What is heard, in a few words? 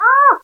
Yell; Triple Town